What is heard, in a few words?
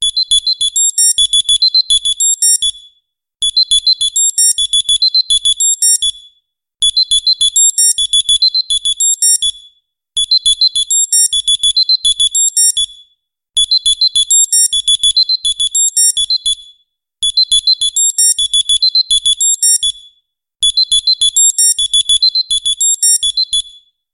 standard clock alarm